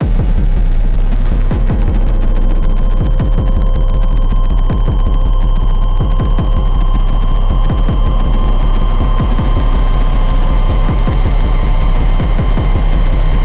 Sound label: Ear Plug Amb, gamerip